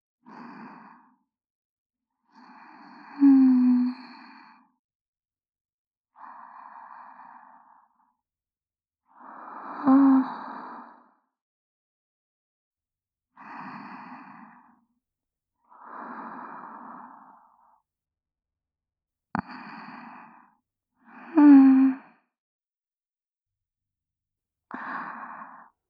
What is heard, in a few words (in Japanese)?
寝息, ループ; 瀬里奈の寝息(左)